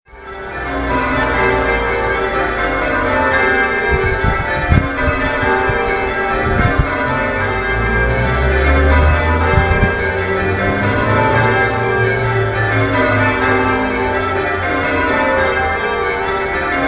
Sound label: st davids bells